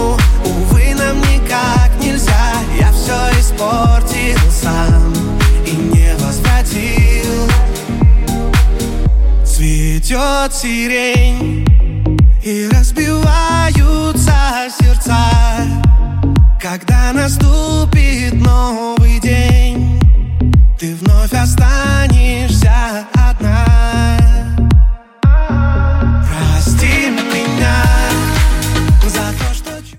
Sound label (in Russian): rington